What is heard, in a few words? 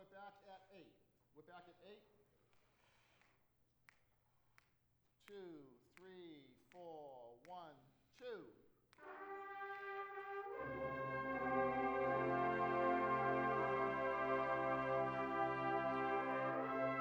TAPS, brass; north star brass ensemble practice lynn ma